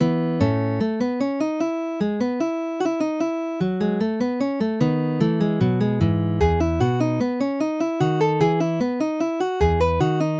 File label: lute suite bouree; bach